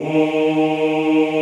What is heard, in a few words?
OOH D#2, Classical Choir; Best Service; Extended Classical Choir